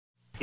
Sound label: Game Over